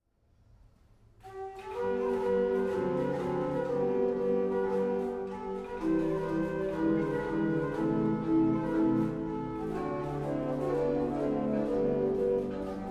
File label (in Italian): Esercizi Per Organo Andante Sostenut; Analogicamente Disposti Dal Ms. N. 822